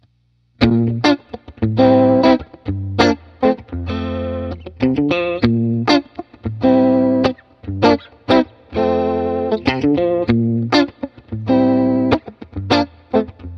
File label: Strat